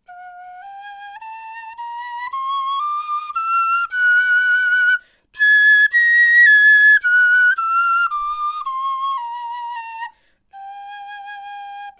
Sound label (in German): tonleiter, knochenfloeten